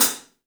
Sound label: 3D Drums, TIGHT ZILDJ; 3D Sound